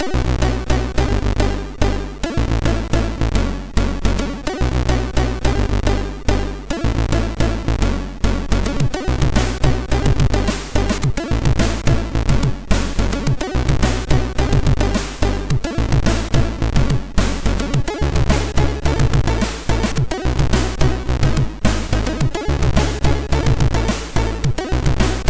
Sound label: sid MOS6581R3; Easy